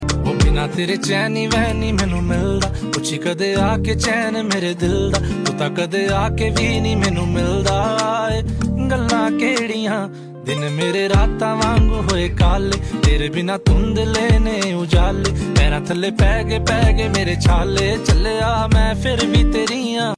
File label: Ringtone